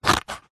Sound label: scissors single cut card; office